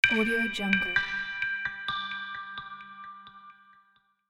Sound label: introduction of bell